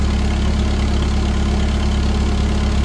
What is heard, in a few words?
build, ambiances